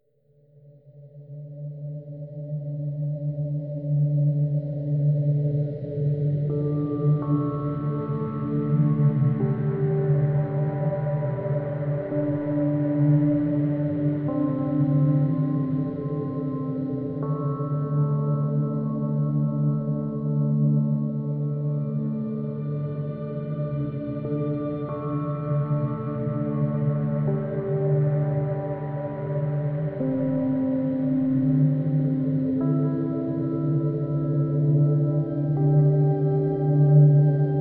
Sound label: Lightning Thunder and Rain Storm